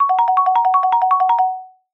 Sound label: arcade game fruit machine jackpot short